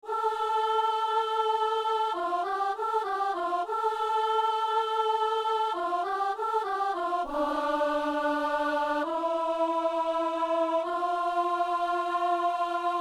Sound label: alto; O Seigneur, en ce jour